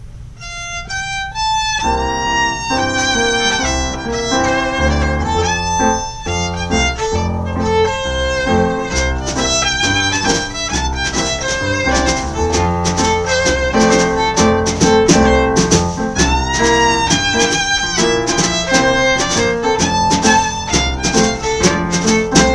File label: Fiddle; Beaumont Rag